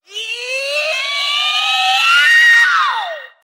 "yeahow"; ooh ahh

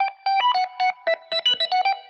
itchy organ, Loops Electronic

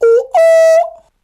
"ooh ooh", voice

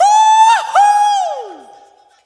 hoo hoo SUNG; ooh ahh; ho hoo woo

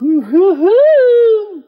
"ooh hoo hoo hoo" female; ooh ahh

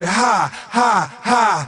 ha ha ha, Voice ooh ahh

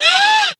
scream whine; ooh ahh

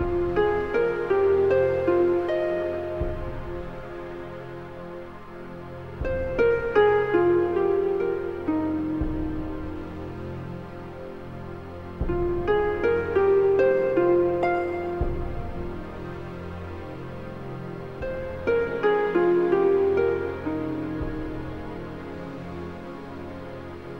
strings, Sad

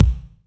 diaper, Kicks Loud

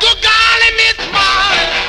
"good golly miss molly" male sung; expletives

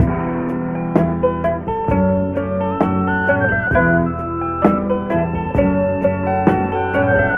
flute, Loops

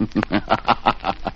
laugh satan

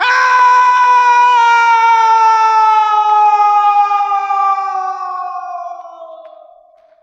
scream

